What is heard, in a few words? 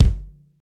loud bass drum sound g key, bass drum single shot tuned to the G note